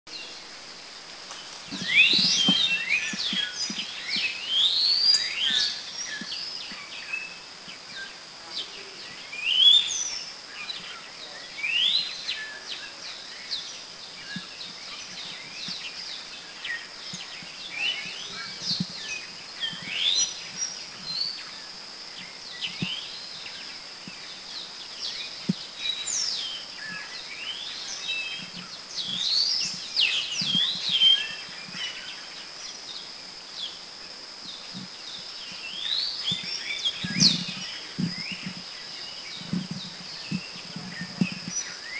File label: Apo Myna, Philippines; Kitanglad